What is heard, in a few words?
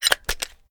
first raise slide release, reloads; gmod